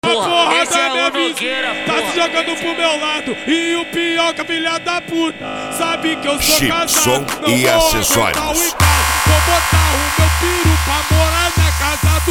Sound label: especial funk